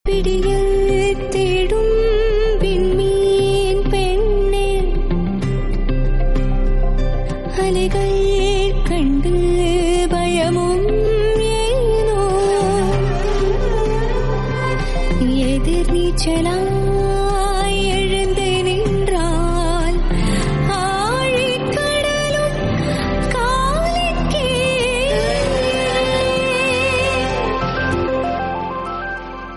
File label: Title Song Tamil; ringtone